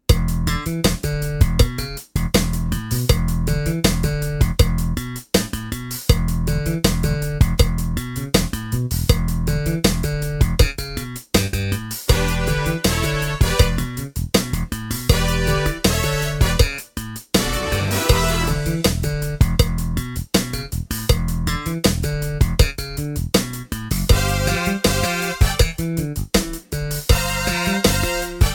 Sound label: Funky Beat